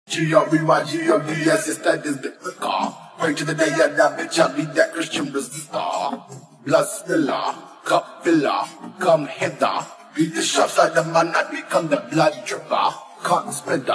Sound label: ACAPELLA